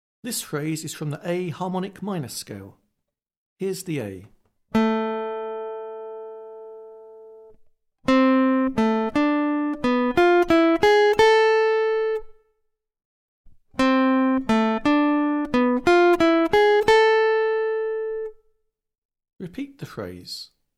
Aural Pitch Test, acoustic guitar; Grade 7

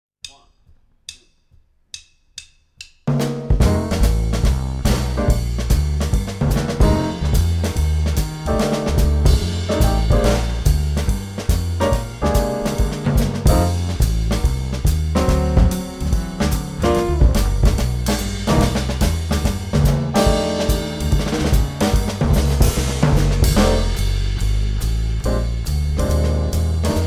Up Tempo Blues backing only, jazz wind

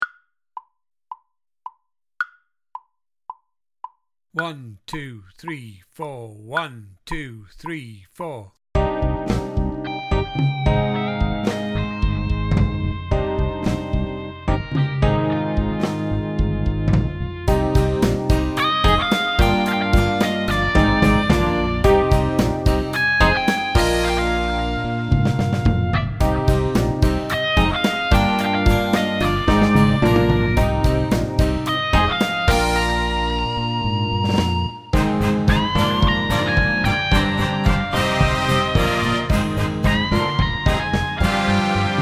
Moon Rock Full Mix, drum kit

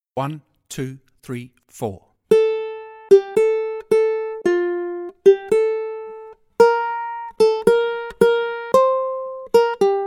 Accompaniment, ukulele; grade2

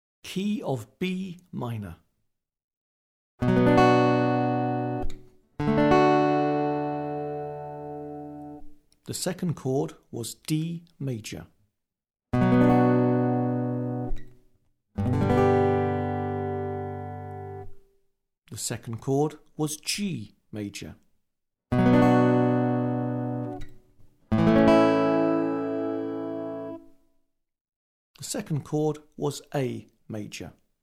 Aural Harmony Test B minor, acoustic guitar; Grade 7

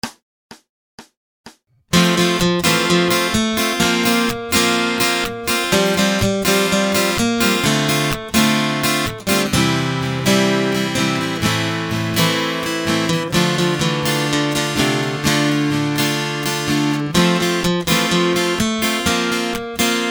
Acoustic Accompaniment, acoustic guitar

